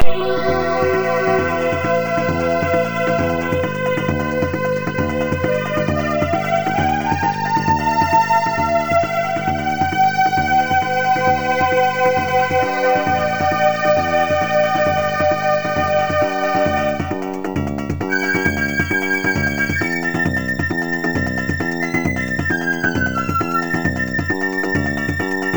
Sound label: concerto